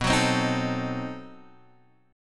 B 7th Flat 9th, down, Guitar, Open G

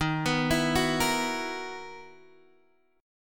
B Major 11th D sharp, guitar

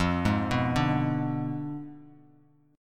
F Diminished 7th F down Bass Standard; Fdim7 arpeggiated; JGuitar

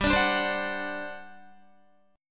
G Major 7th Suspended 4th, G, down, Guitar; GM7sus4; strummed

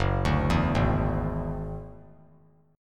F sharp 7th Suspended 2nd Sharp 5th; F#7sus2#5 arpeggiated

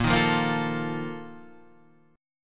Bb Major 7th Suspended 4th, Guitar, Standard; strummed; JGuitar

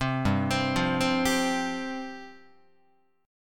G 7th Suspended 4th G down Guitar

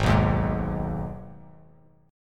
G sharp Minor G sharp down Bass Standard, chord; G#m strummed; JGuitar

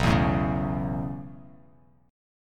B 9th Suspended 4th B down Bass Standard; B9sus4; strummed; JGuitar

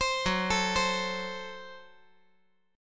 Gb Diminished

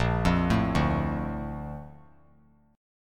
Bb Major 7th, chord; BbM7 arpeggiated; JGuitar

